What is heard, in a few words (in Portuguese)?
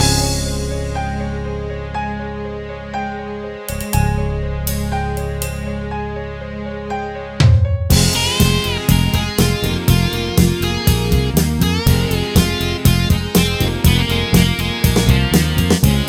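amostra rock lento solo guitar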